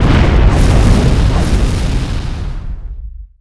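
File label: cast skill magic burst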